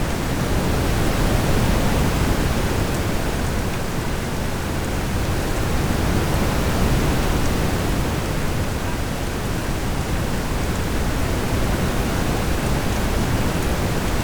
Relaxing White Noise Rainfall Rain Sounds Nature Sounds